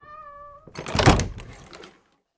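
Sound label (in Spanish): CERRAR PUERTA, close door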